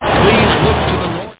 radio; Internet 1996 World Exposition; Japan; Panasonic

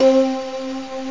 bright, tones; gmplay

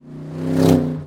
end game; xmr

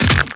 break; WCWDEMO

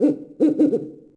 bird owl; DAoC

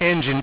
engine

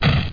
bam; THE REALM

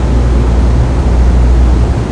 fan mach, amb machine; SiN; environment

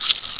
CRUNCH

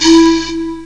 Flute; MYTH

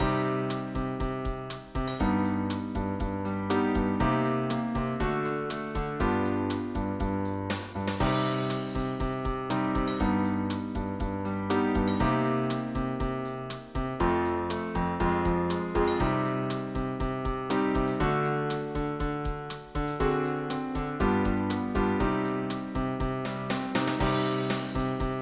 ballad; EuroCD 3

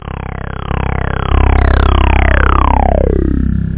block; da capo vol1; instruments; whoosh

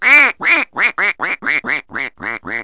quack, animals; Wired for Sound Pro CD; Aristosoft, Inc.

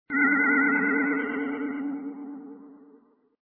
SPACE SOUND; ufomania

